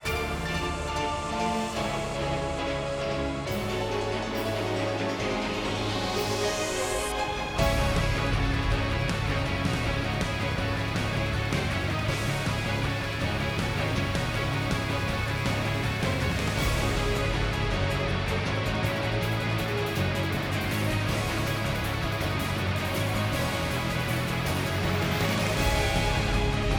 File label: Battle Boss; Type Fakesister